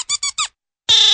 four squeaks and a raspberry

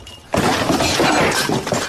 man falling down stairs meme

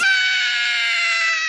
red larva scream

